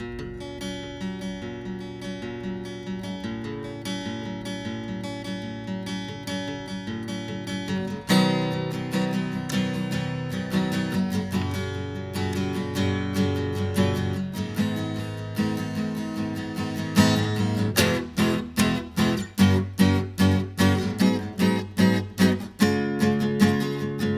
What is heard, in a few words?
AcGtr RCA Hybrid